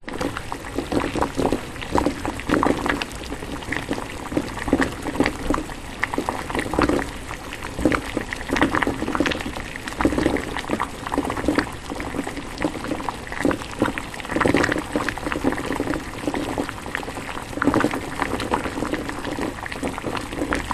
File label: food eggs boiling in pan; zapsplat